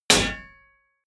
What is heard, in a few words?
clang medium